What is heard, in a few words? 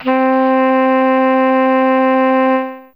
Tn Sax C3; Fairlight CMI IIe; Reeds